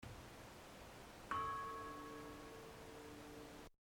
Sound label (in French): cloche; Vernet les Bains, cloche 4